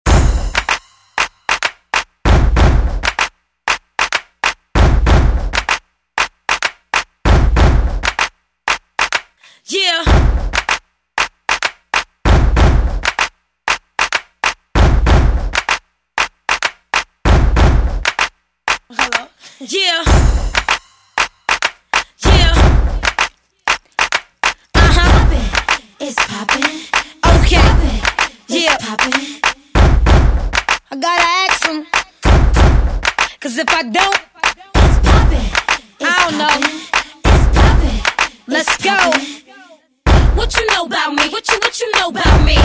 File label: Straight Kutz; DJ Mhark